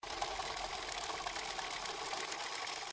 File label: spin, slots; Wildlife Adventure